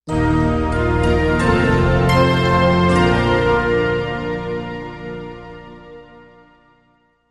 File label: Music Logo Chimes